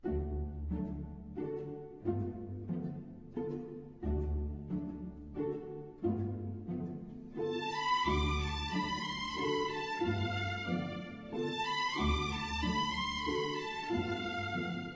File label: Minuet